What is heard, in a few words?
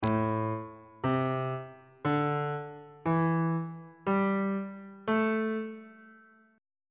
a minor pentatonic scale on bass clef